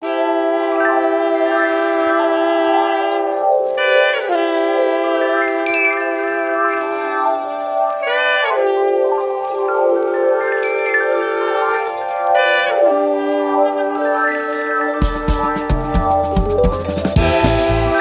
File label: blues